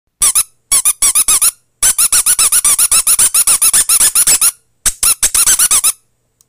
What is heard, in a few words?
Squeaker for toys